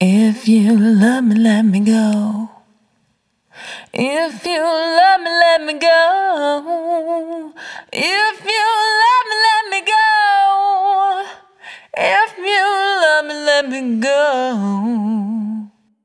Let Me Go, Vocal Samples, Single Voice Melodic Loops; Singles